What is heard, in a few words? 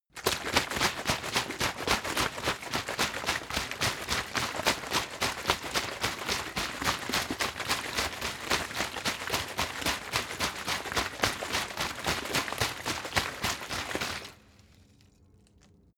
bottle water sparse shake; gastronomie; berufe